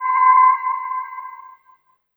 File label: SYN, 160 BPM, Drum & Bass; Ueberschall; Drum & Bass Flash Trackz